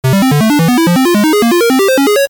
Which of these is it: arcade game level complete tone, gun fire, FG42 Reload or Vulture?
arcade game level complete tone